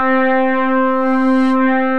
Bell Riddence; Wavetable; Ableton; Synth Essentials

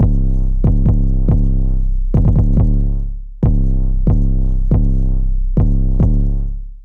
808 Bass C 70 bpm; Trap Drums; Flux; Trap Drums by Sound Oracle; Ableton; Factory Packs

